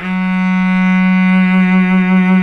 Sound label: F#2 SOLO CELLO; Northstar; New Gold VOL-2